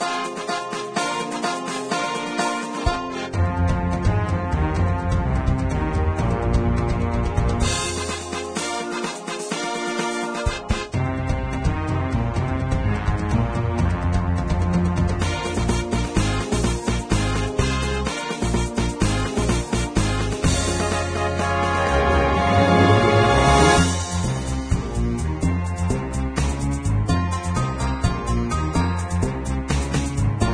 Action; wild west guns java android mobile gamerip 2011

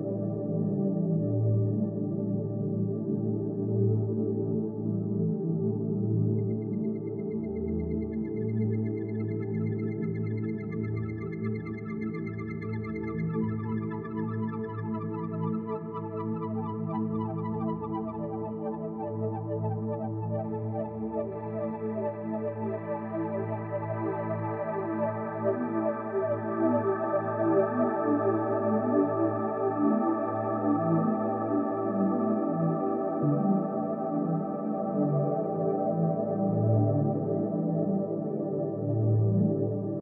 menu loop

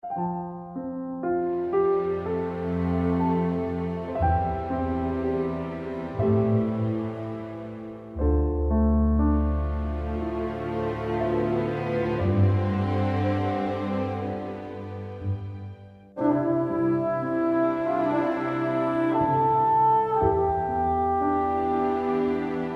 Field BGM; Xenoblade Chronicles 3

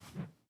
tools sledge

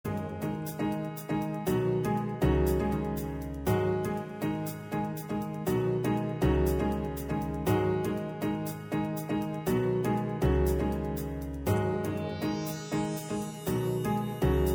Music Menu; Into Space

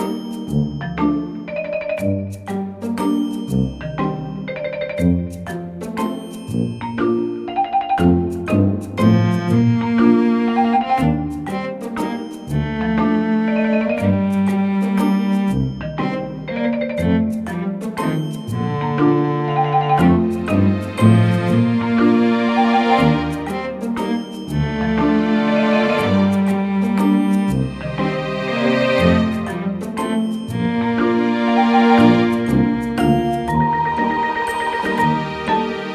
relaxing slice of life loop; Eternal Radiance

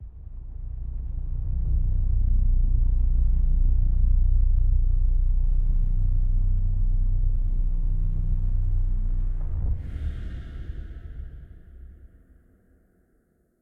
Desert Mech energy Crystal rising; Desert SFX

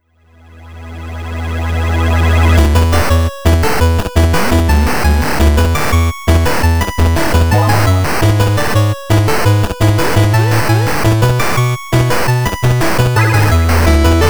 Menu Music 8-bit remix; Fruit Ninja Kinect 8-bit Cartridge; FNK

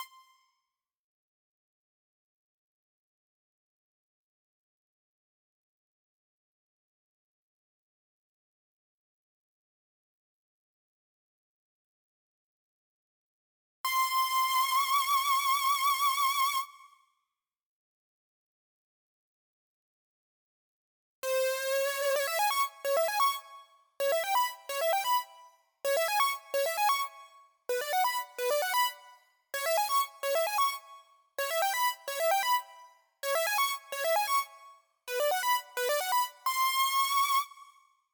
Doom Mix Stem Layer; Asteroids

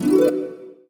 Harp; ChooChoo Heroes

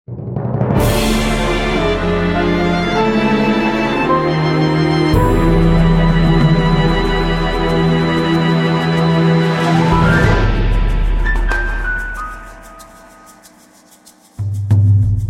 postmatch losetheme; Might and Mayhem

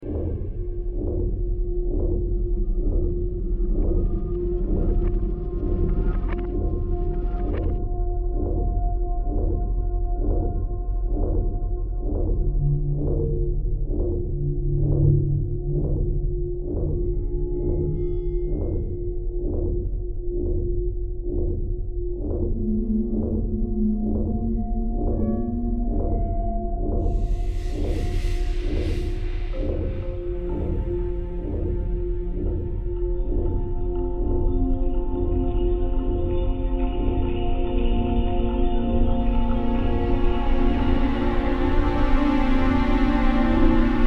Dungeon

